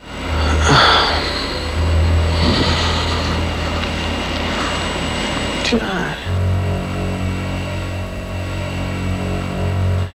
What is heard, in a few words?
Tense D#2; Zero-G - Ghost In The Machine